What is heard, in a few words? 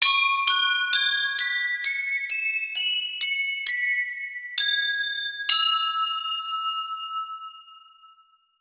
TIB BELL MULT; eShop